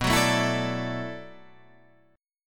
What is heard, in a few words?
D-Major Add 9th B; strummed; JGuitar